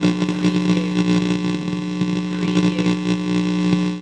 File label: Electric Interference